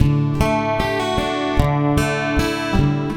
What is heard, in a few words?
Picking G